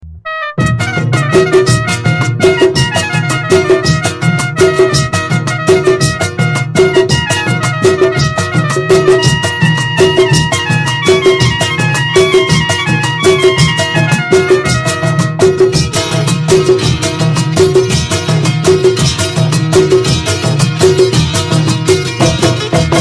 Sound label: Baloch